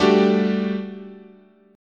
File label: G Major 7th Suspended 2nd